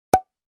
Pop up Sound effect, whatsapp notification sound effect